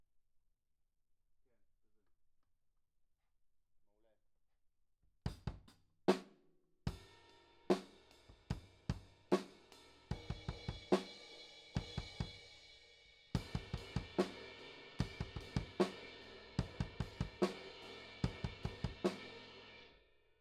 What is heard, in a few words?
Kit